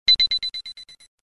Ah Ah Ring Sms

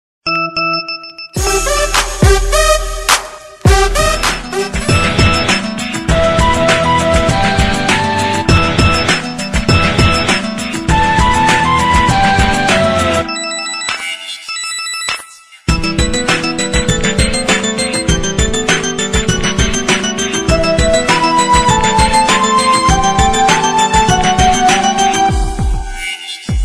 message tone remix

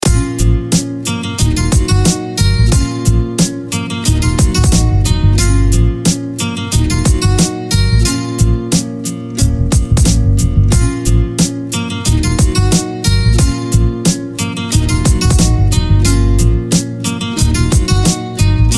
hip-hop guitar

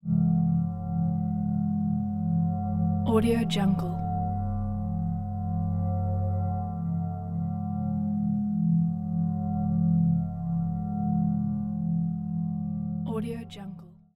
bell

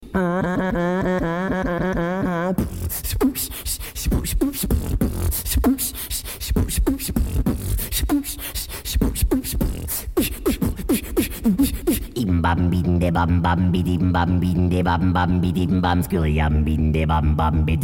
funny beatbox

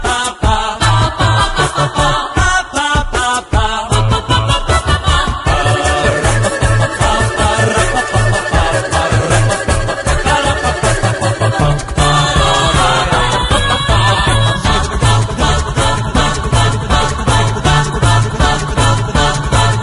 clasical

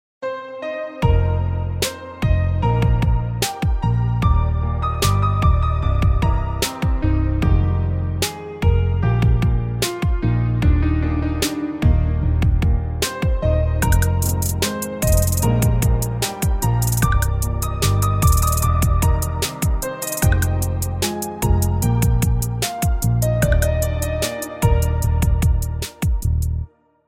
Ringtone Marimba Remix

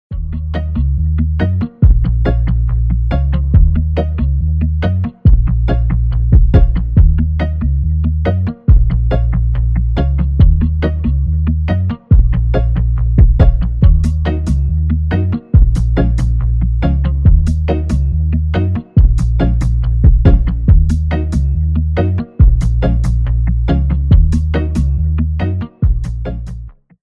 iPhone 11 Home Club

